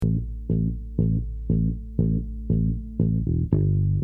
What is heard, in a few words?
bass